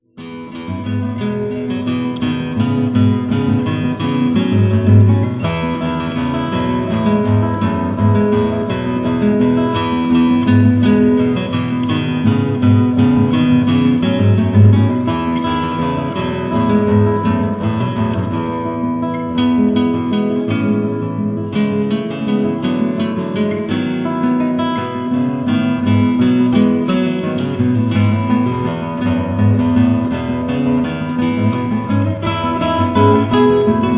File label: 3 Guitars; Lobe Den Herren